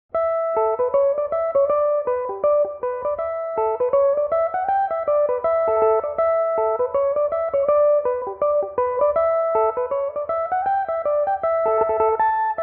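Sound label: A mixolydian, irish mandolin, tunes; eel in the sink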